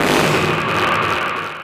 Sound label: SFX, Drum & Bass, 168 BPM; Ueberschall; Drum & Bass Resonance